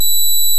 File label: DC offset Wave B7